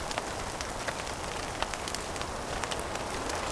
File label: rain